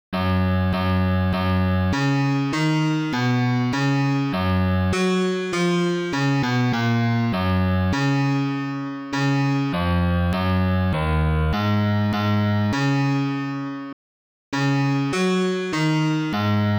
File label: Bass